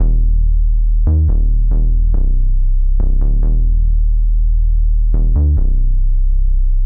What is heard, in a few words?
70 Cm Perc Bass